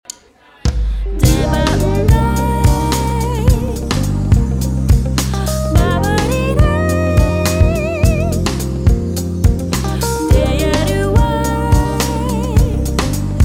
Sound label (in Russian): арпеджио на Smooth Jazz; Пример для вокалистов